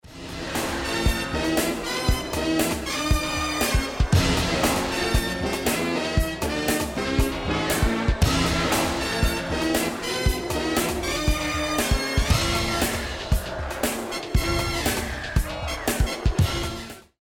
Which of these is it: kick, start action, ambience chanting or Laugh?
start action